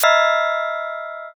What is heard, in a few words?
sting